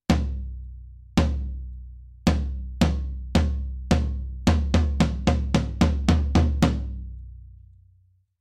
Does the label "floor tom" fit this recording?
Yes